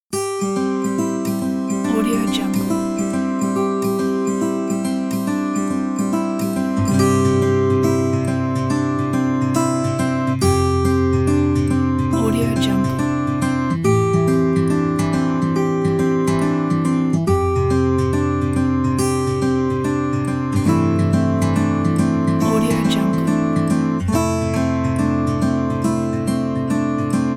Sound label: acoustic guitar backgorund